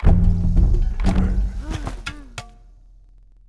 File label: Goody Settler; Ambient; Civ4 warlords